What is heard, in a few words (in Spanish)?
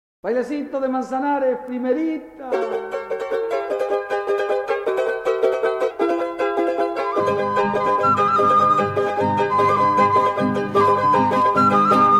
bailecito, andean flute